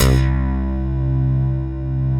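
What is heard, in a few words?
clavinet C3; CLAVNUTZ; Synth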